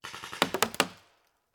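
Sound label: Paintball Gun Impact; Sound Ideas; Guns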